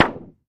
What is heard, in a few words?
Target Hit Light Pap, target range